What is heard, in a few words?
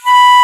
Flute p B3; Digital Sound Factory Virtuoso